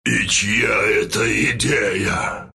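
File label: death long, Russian; dota2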